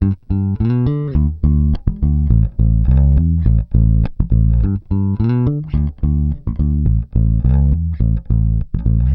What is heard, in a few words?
Pop, Bass